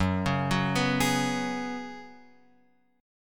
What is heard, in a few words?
F sharp Suspended 2nd Suspended 4th, Guitar, Open F; arpeggiated; x,1,1,1,2,3; down